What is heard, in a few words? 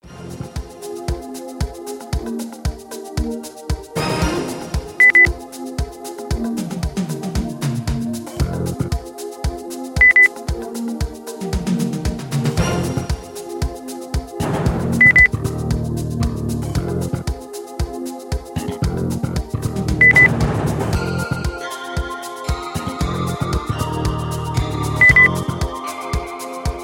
percussion